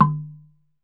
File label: TUNG LO, African Perc; E-MU Producer Series Vol. 7 – Old World Instruments